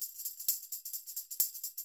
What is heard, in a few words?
TAMB, Percussionworks; Sampleheads; NYC Percussionworks